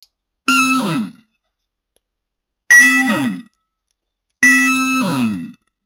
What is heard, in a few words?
formula 1 racing; race